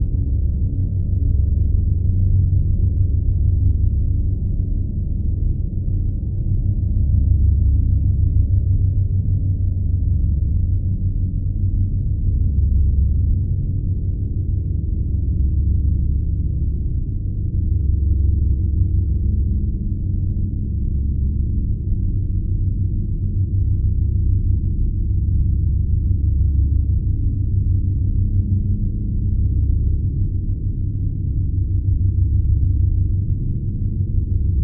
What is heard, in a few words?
Drone Science Fiction Power Source Constant Steady; Production Element Imaging; AdobeCC